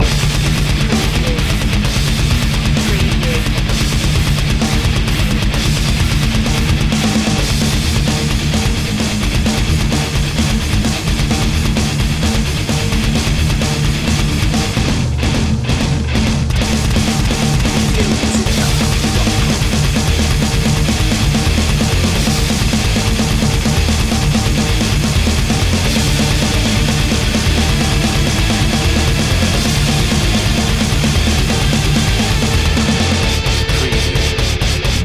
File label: what happened loop